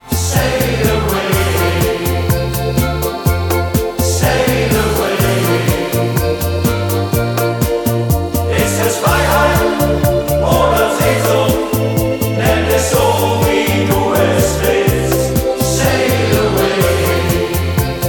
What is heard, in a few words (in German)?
Shanty Chor